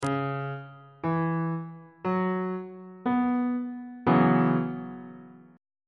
f sharp dominant 7th suspended 4th 2nd inversion on bass clef